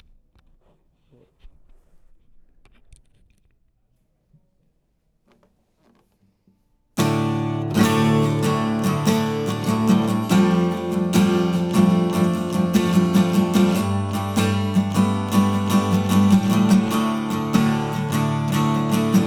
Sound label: guitar, Olympus LS-11; Guitar Recording (1m38s); built-in microphones; WAV File - 16 bit Stereo 44kHz; Olympus LS-10 and LS-11 Recorders Reviewed